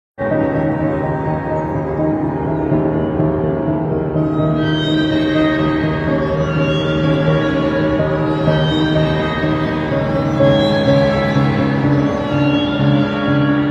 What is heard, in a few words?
Horror Piano Tune